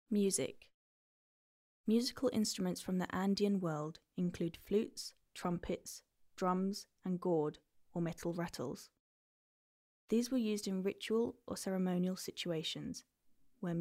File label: music; audio guias; ingles; andes centrales